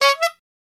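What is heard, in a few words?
Horn Bicycle Bulb Single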